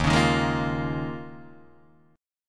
D sharp 7th Suspended 2nd Suspended 4th, Guitar, DADGAD